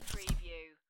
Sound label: Playing Cards Deal Single Card; Turn Or River